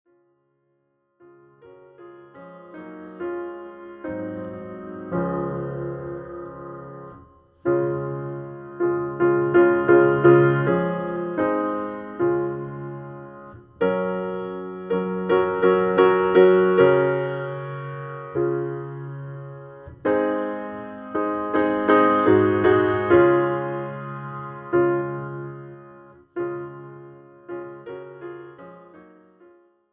PIANO; gospel

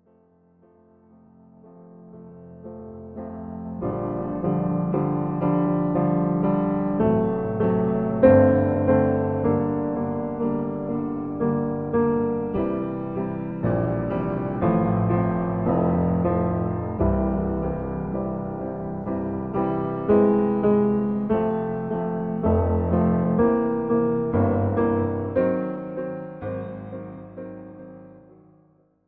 LOW VOICE, piano; SCHUMANN

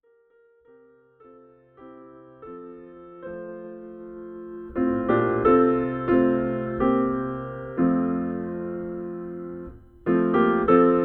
PIANO; gospel

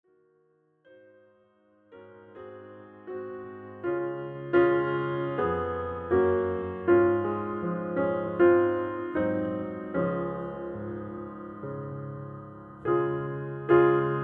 PIANO, gospel

